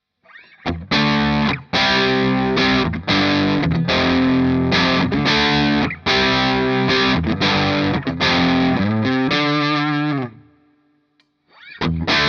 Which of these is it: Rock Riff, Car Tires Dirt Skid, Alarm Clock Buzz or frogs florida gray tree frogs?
Rock Riff